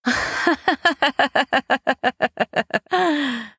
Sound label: Laughing; Devour; Anna